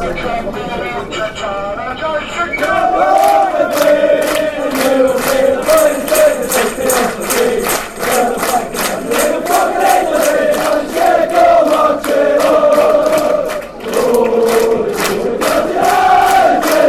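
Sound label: glory glory dundee united, football chant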